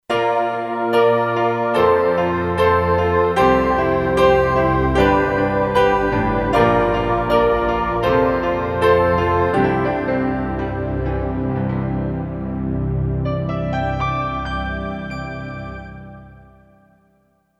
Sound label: Kurzweil K2500X E Grnd Pad